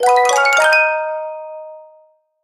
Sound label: rank Up; Piloteer